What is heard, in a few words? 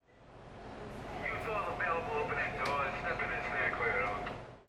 VEHICLES